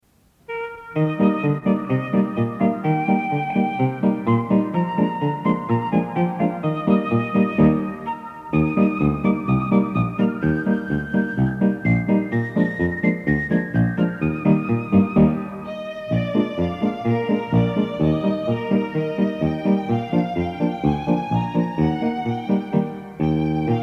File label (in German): Die heitere Flöte